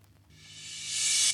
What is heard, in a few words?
Cymbals Reverse Crash; Vengeance Samples